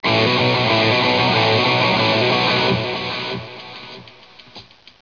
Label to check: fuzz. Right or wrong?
Right